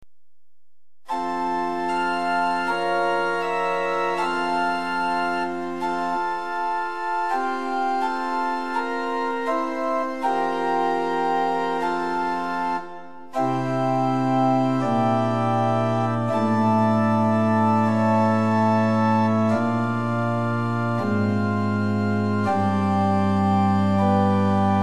Liturgical Organ Books, Pitch 440 Temperament Equal; Prinz 8 4; hymn